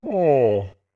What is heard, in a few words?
Ahh; Goron Link refreshed after a drink